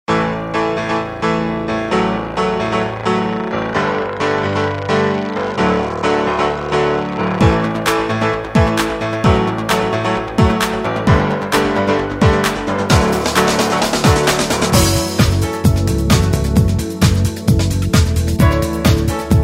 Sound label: Piano Version